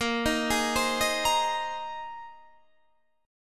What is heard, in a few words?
A sharp 7th Suspended 2nd Suspended 4th, down, Guitar, Open D